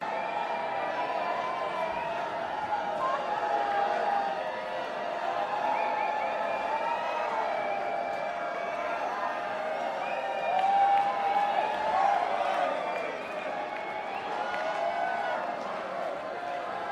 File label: Crowd